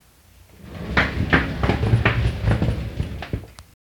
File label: Rolling Suitcase